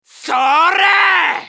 攻撃長; ソホ(格闘型)

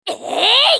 ファイター女･攻撃

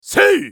攻撃 短; ナル

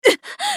女･被ダメージ; ウォーロック

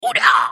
攻撃短; ジャック

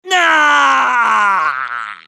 男 死亡; マスケッティア

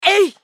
ファイター男･攻撃短

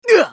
スカウト男 被ダメージ

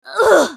ファイター女 ダウン

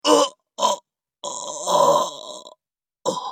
死亡; イェガネー

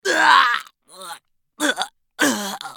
死亡; コインブラトゥルーパー

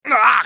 被ダメージ; ナジブ

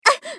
被ダメージ; グレイス

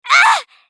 女 被ダメージ; マスケッティア

